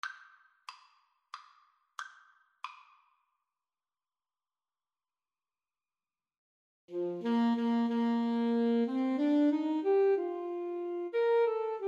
shenandoah 2 A SAX

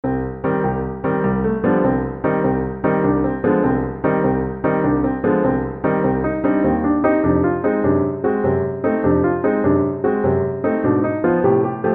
piano

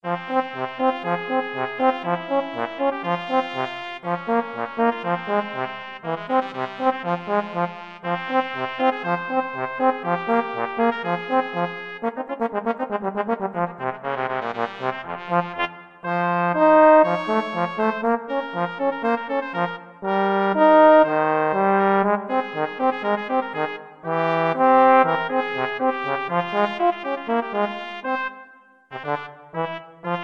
trumpet trombone duet

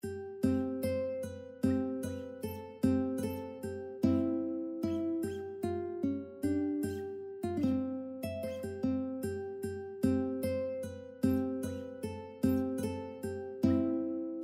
ukulele

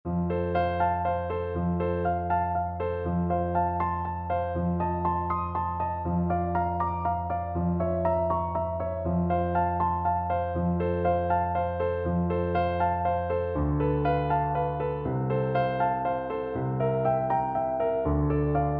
ave maria, Bassoon

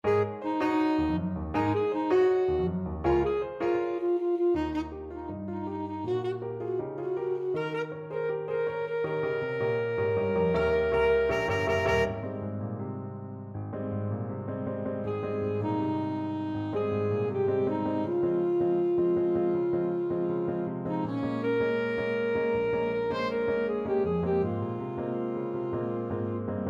ASAX, saxophone

